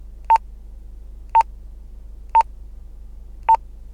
Motoxpr4350 Chanel change

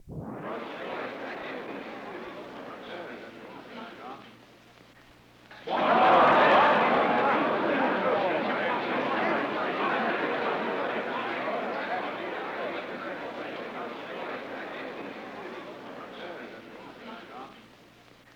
Crowd Murmurs And Gasps In Courtroom

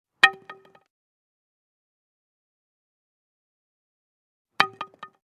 Baseball Bat Wood Drop Bounce

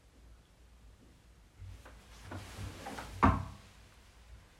Getting Up Of Bed

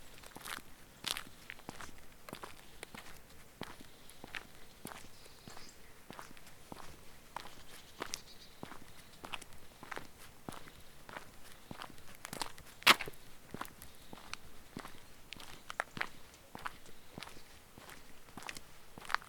Steps From Asphalt To Macadam

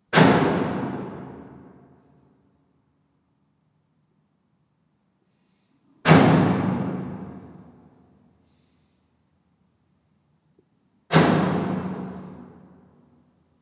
Three Low Metal Clangs With Reverb

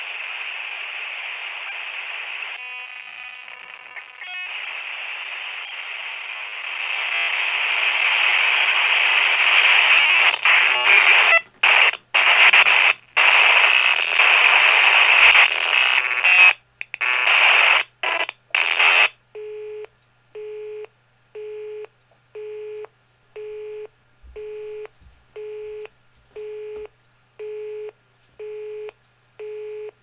Phone Noise

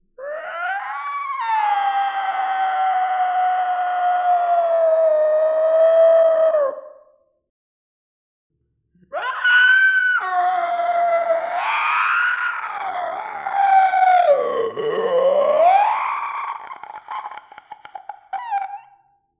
Scream

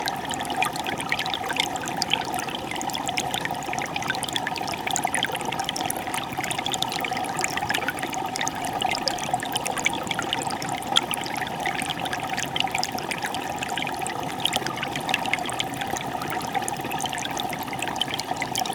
Small Brook

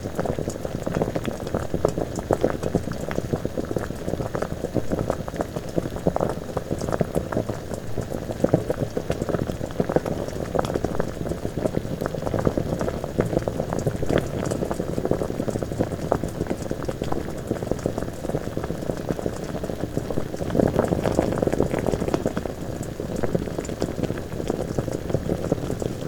Gas Cooking Rice

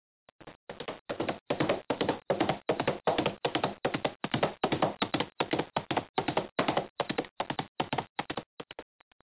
Galloping Horse

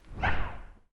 Whoosh Bamboo

